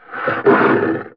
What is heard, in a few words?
Leopard Bite; Playable Tutorial Level; TR2